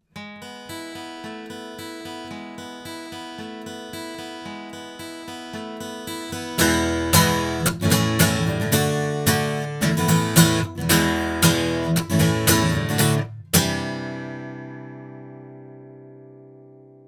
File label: MXL 1000 guitar